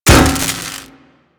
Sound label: Electro Prod Hit; Warframe; Weapons